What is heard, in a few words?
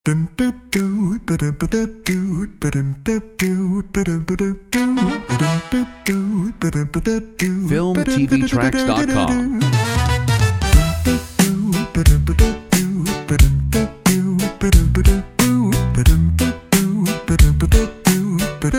BOSSA HIP HOP; comedy funny